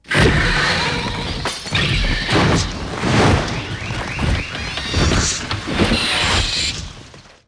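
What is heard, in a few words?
bat sounds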